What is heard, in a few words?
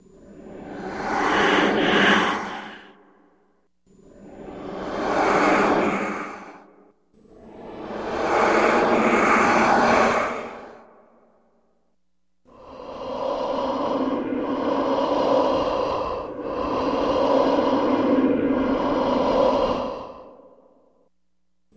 Ghostly Breathy Ton